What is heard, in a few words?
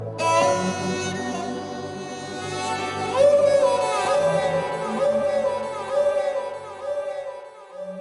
Emotional Tune